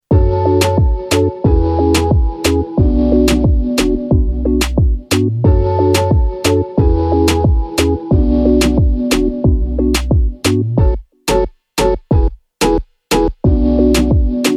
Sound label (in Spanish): remix instrumental